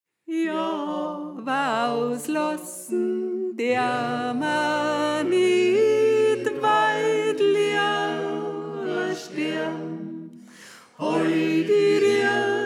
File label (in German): Sopran